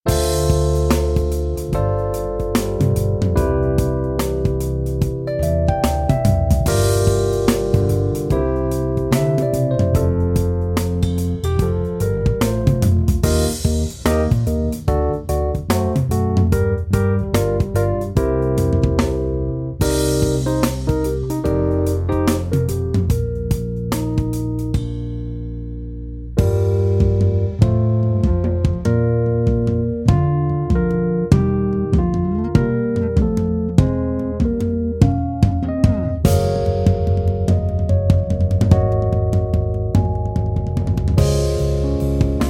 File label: trio jam drum kit; soundiron; david oliver s drum kit